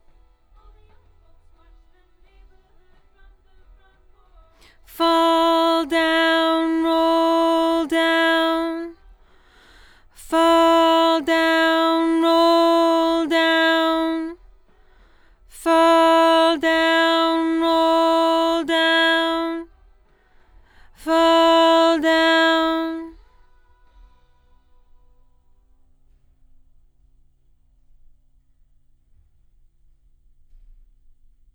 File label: LdVOX Double